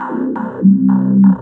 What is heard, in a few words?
rez beat; Shogo